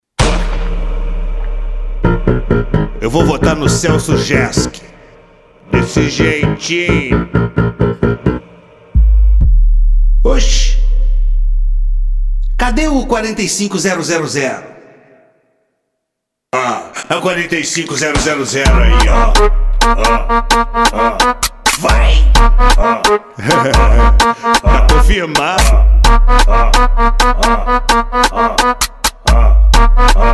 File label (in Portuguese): Jingle Parodia Funk